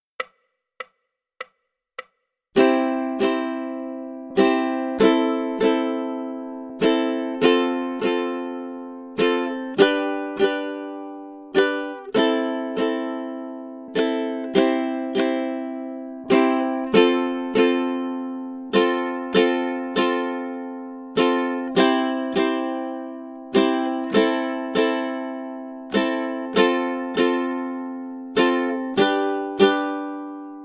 Rhythm Study, ukulele

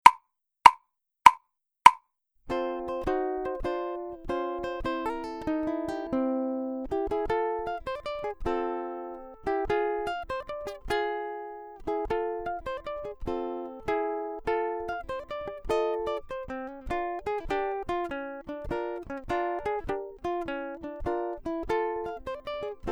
Blues in F, ukulele; grade7

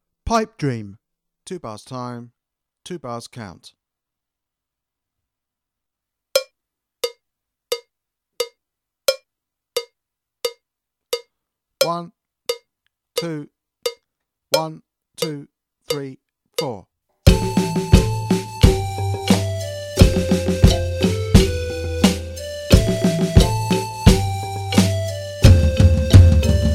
drum kit; Pipe Dream

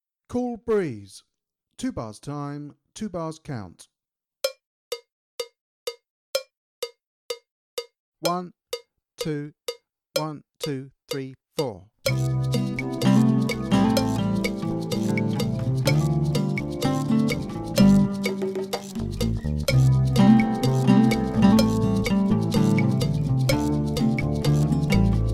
Backing; drum kit

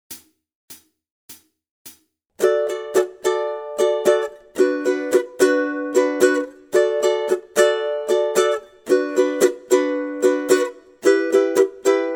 rhythm study, ukulele; grade4

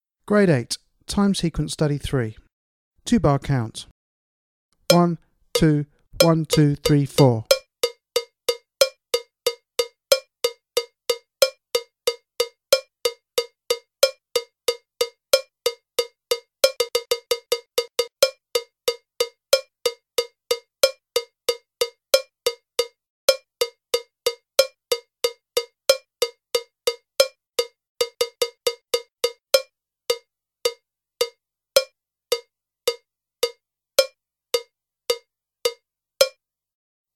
Click Track for Time Sequence Study, drum kit

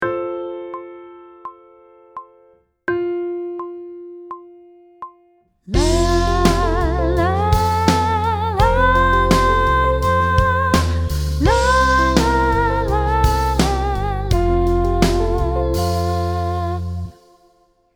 Sight Singing with vocal, pop vocals; Grade 1

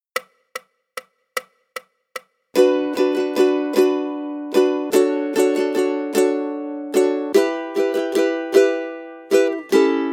rhythm study, ukulele; grade3

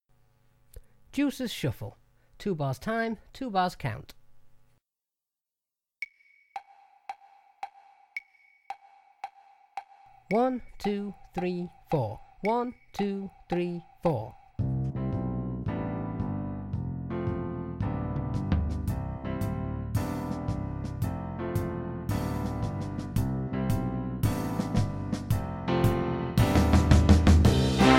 Shuffle, drum kit; Deuces

